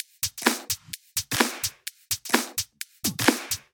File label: 128BPM Resistance Kit